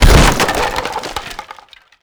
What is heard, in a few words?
impact wood break